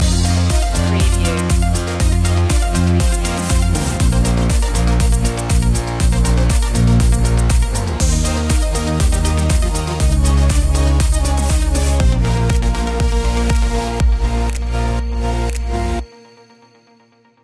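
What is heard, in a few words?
Electronica Electropop underscore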